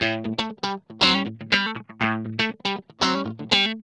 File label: GUI, LICKS; 120 LICKS